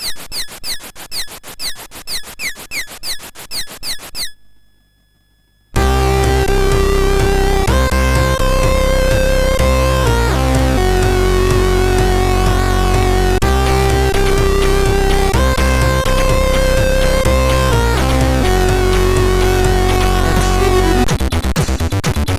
Colony, MOS6581; MOS6581R3

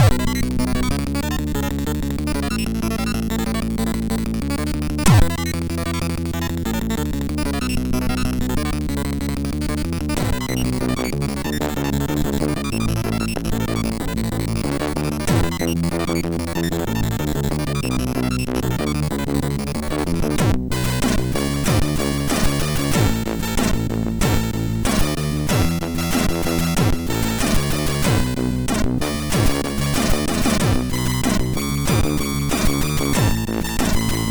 sid MOS6581R4; G-Lame-Rous

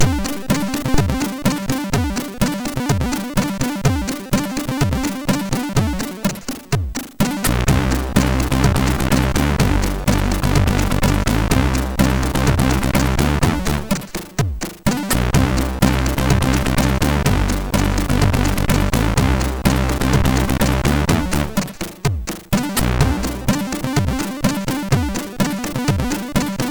Sound test, MOS6581R3; hvsc

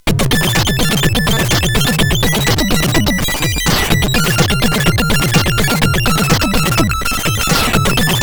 loop, SID CSG8580R5; Shitloop 3

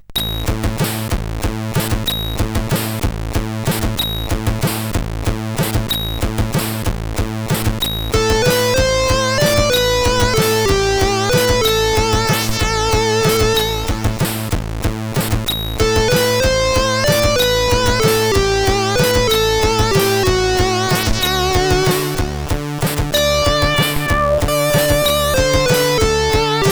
Waltz sid CSG8580R5

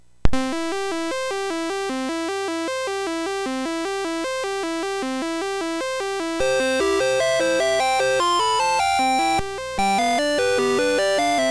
Music Box, MOS6581R3; Yewchuk Bob

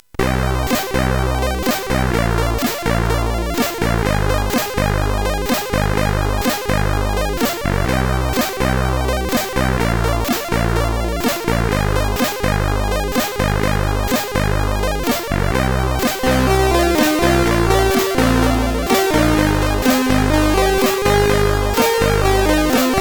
End sid CSG8580R5; Babygang; Shade

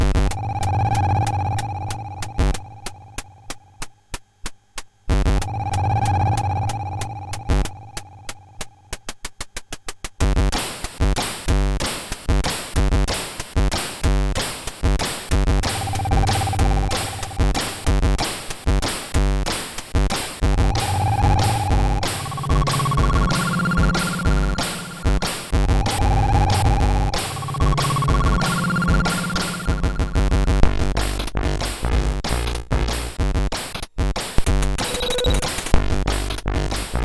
sid, MOS6581R3; Twisterbeat

